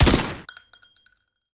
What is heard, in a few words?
ak47 fire; weapons